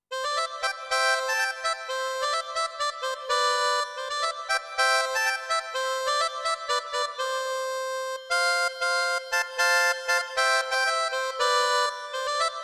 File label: Harmonica